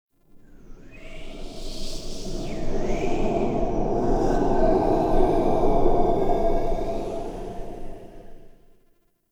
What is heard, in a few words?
Amb Corrupted Wind Gust; Ambience; Mendicant Area